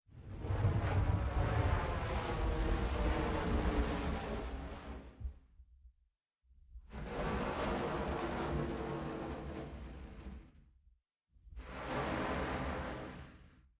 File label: inflating big balloon